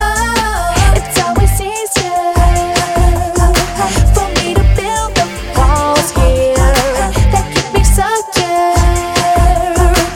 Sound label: scream